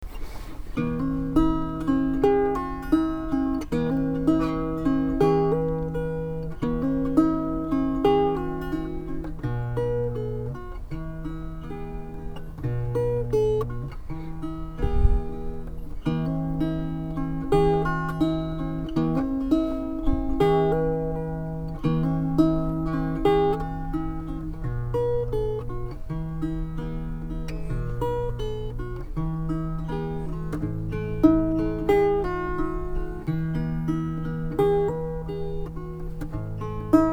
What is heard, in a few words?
guitar instrumental